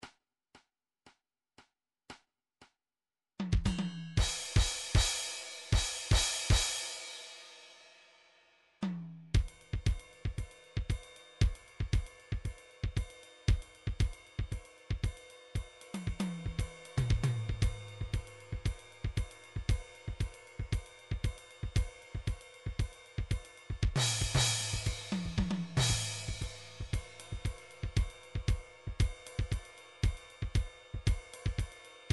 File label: latin 116 samba, midi drum styles